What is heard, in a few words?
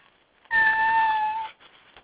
cat meow